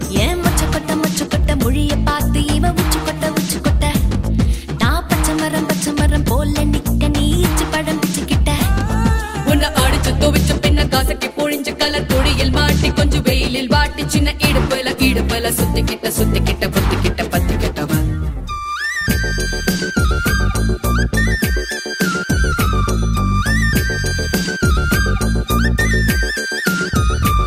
Ringtone Female; Spyder; Tamil

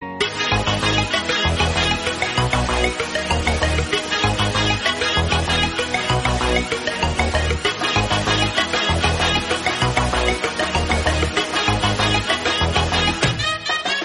Cute Love Bgm Ringtone

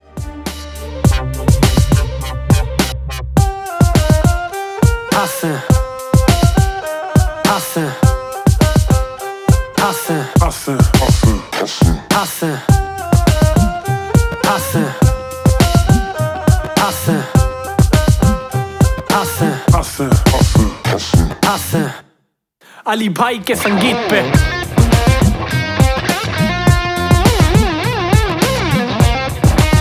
Instrumental

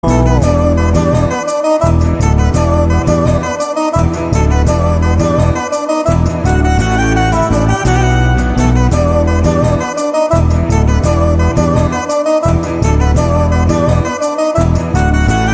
Instrumental BGM; Vaaram; Chal Mohan Ranga; Telugu

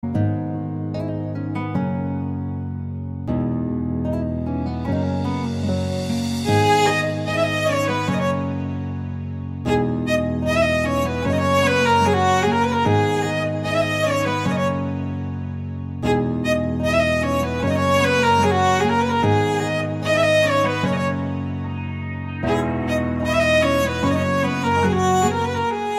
Instrumental Tone

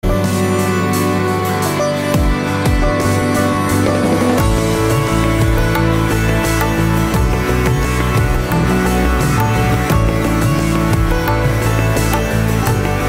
Instrumental

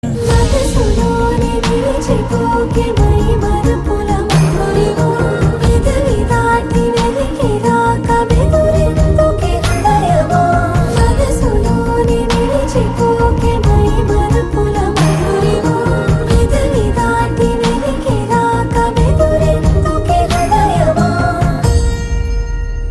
BGM Ringtone, Telugu; Varudu Kaavalenu

